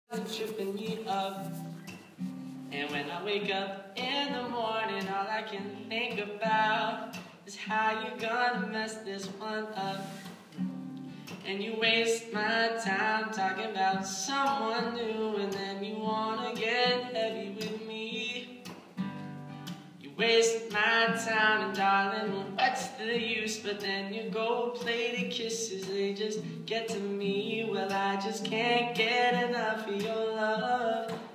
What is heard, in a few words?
Singing